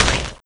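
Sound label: maggot footsteps; doom3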